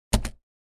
Computer keyboard spacebar key

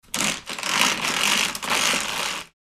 Roller blind cord pull roll down; blinds

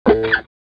Electric guitar scraping on strings

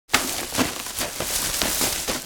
Garbage bag rustling handling

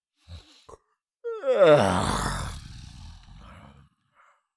Cartoon lion voice yawn

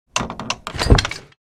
Vampire coffin lid close

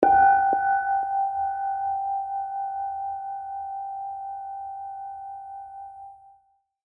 Meditation bell ring chime tone

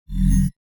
Futuristic transition

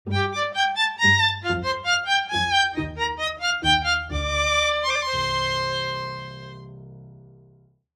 Game over you lose fun playful

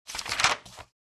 Softcover book page turn

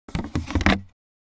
Bathroom trash bin lid open

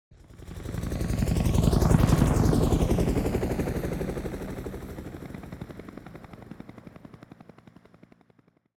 Helicopter flying overhead pass by

